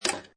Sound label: Pinball Sound Mechanical Clank; Video Games